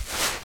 fs sand, singlesteps

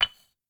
construction stone

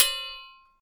shield metal, attack impact

